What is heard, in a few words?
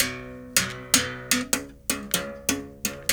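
79 bass berimbau phrase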